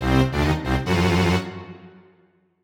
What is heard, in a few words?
Sudden Death; Banjo-Kazooie; Grunty's Furnace Fun